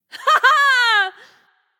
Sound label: "yay"; smite; nuwa